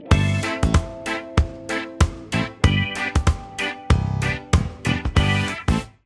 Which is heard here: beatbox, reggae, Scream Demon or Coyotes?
reggae